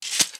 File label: Sword Away